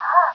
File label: jump; quake